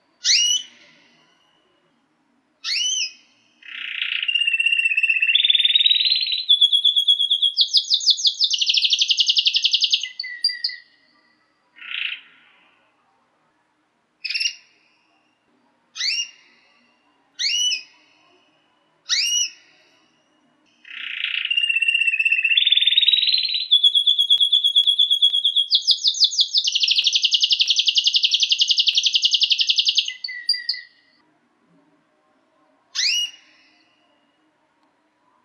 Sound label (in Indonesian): suara kenari dutchfrill